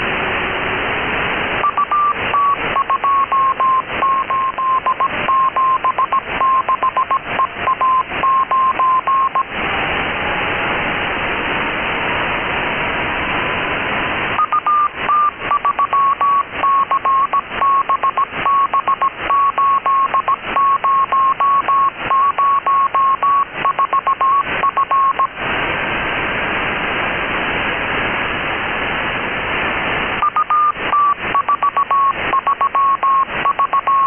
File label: CW TLM